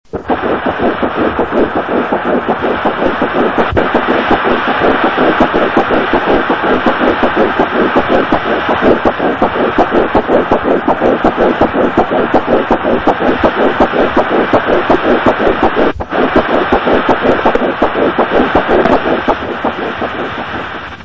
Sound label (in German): herzton